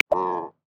Galapagos Cormorant; Xiaomi Redmi-8A

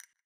Unlock, phone ui; Sony Ericsson Xperia Arc

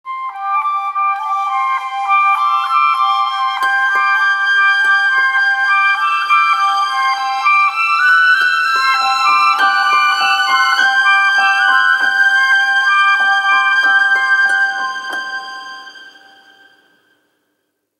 Alarm Placido; Vertu Constellation T

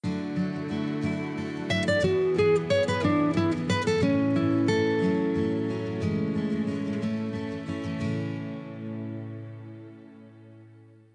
Nokia Tune; 5030

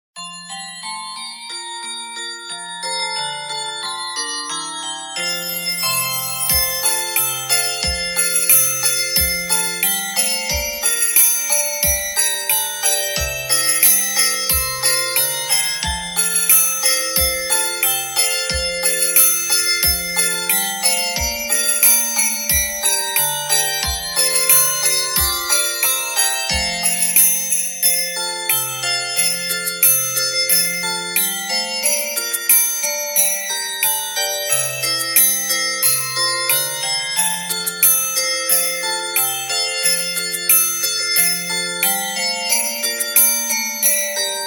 Jingle Bell; ZTE T203; RingTone

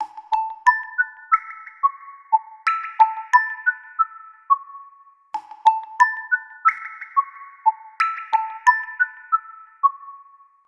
ringtones; Victoria; Essential; PH-1

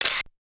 Shutter

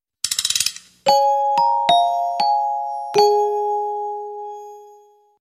Music box, ringtones; Huawei Y320 U10

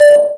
Video Record, ui

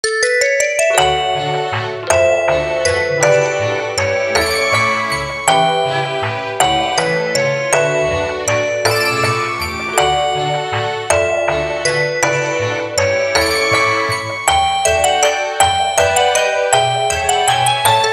Waltz; SKY IM-U310K; Easy Listening; Waltz 4 Flower

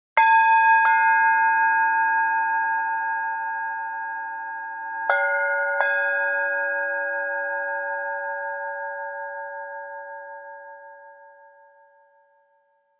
Morning Alarm; Samsung; GT-I8320 Vodafone 360 H1; Ringtones

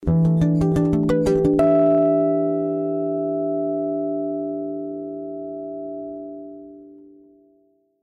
Harmonics, notifications

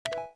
pound, Keytone sounds; LG GD900 Crystal; Keyboard

